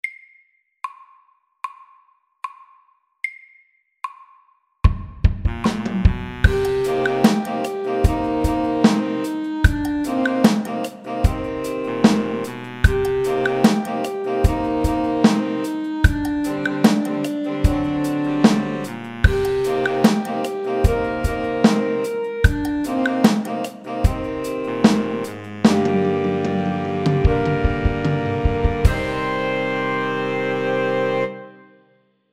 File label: Funky drums SAKTE